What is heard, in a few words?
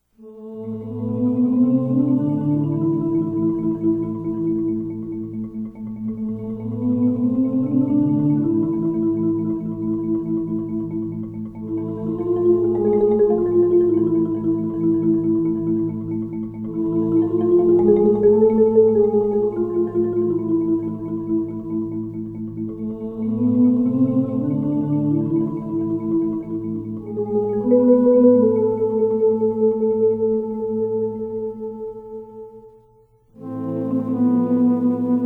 orch